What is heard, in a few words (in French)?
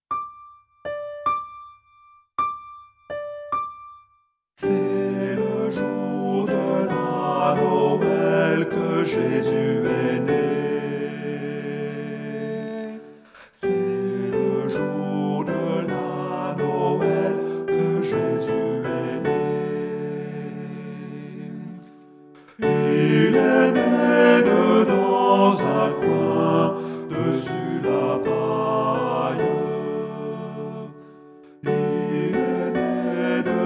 noel tenor